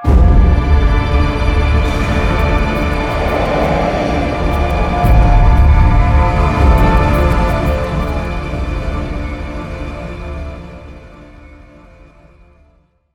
Town Fight; Torchlight II

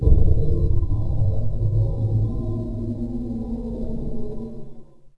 Pound Growl; Ambient; Sid Meier's Civilization 4 Complete; Beyond the Sword

